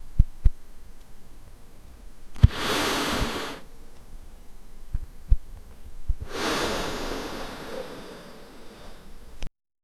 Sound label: pillow inflated